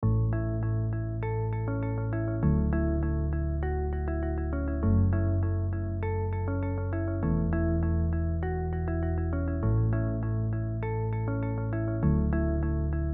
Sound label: deep minimal relax